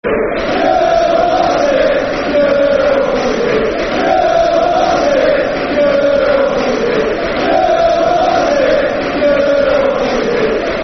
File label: You Are Shit, You Are Shit, Football Chants (Soccer Song); Chelsea Cfc